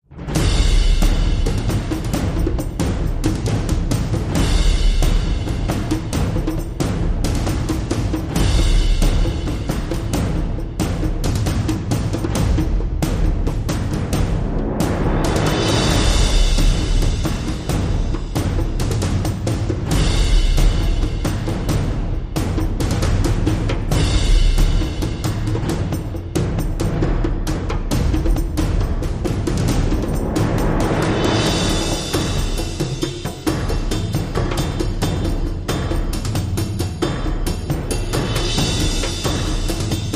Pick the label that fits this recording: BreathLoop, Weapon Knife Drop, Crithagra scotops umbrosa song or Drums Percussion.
Drums Percussion